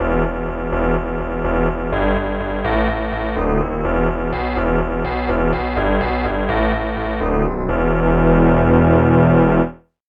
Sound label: bagpipe bass